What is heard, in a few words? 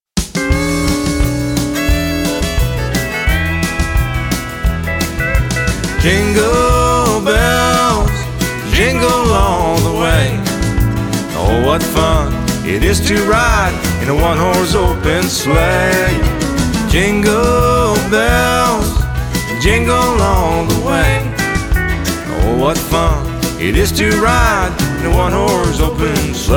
Jingle bells